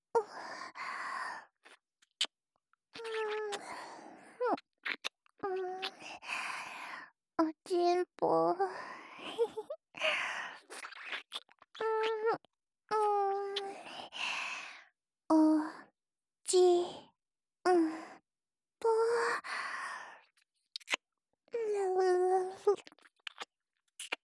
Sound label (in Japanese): キス, ASMR